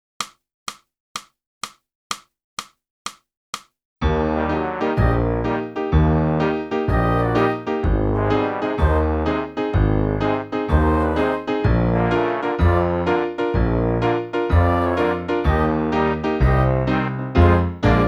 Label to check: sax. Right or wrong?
Right